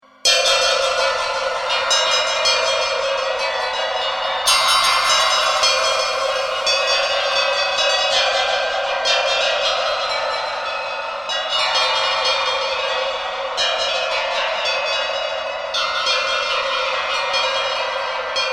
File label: Strange unearthly chiming bells; Musical Sound Design; Eerie Chimes